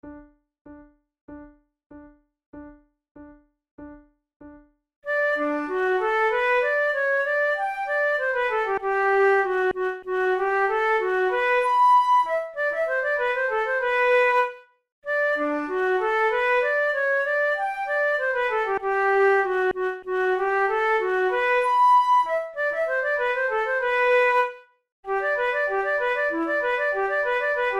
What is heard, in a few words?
duet; weber; der freischutz